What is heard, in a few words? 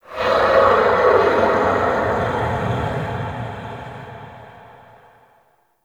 Forest Distant Creature Large, Ambience; Warframe; Grineer